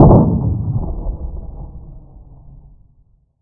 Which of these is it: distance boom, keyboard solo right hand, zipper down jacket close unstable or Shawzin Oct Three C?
distance boom